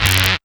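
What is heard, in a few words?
buzz chord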